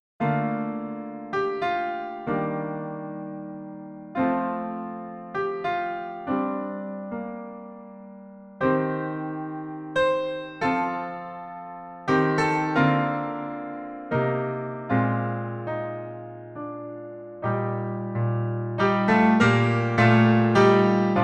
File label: Silent Night Piano Solo; Hymn Charts